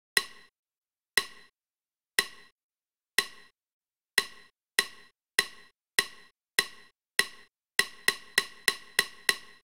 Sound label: camera timer; SE W510 Twiggy